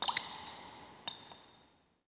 drip; Stake Fortune Fighters; XBOX

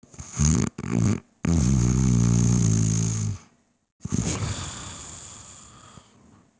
snore; Soldier Of Fortune II Double Helix; XBOX

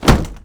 bail body fall; skater; Tony Hawk's Project 8; XBOX

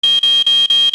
metal detector, airport; Soldier Of Fortune II Double Helix; XBOX; ambience

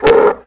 snort; tusken; Star Wars Obi-Wan; XBOX

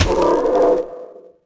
egg hit

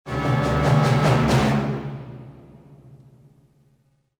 tunnels, music; Star Wars Jedi Knight II Jedi Outcast; XBOX; artus mine

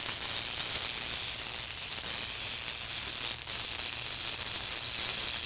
Zap Bed, electrical sparks

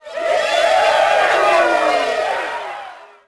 CROWD POS HIGH; Cars; XBOX

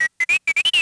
vox, droids robots

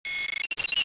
warning, laser trap; weapons

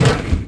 bouncy plastic metal chair; bouncy objects; Tony Hawk's Project 8; XBOX

